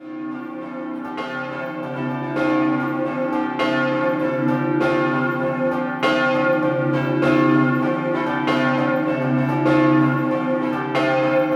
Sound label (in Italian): Gradisca Scampanottata, campane